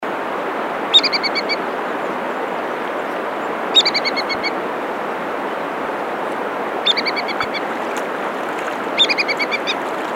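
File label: ibisbill voice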